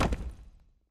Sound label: block ground; pyramid